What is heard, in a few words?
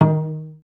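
cellos pizzicato D#2; Best Service; Peter Siedlaczek's Advanced Orchestra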